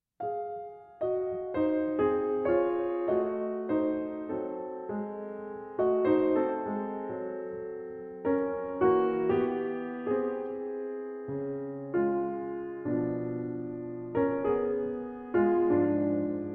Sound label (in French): etudes pour piano pour les sixtes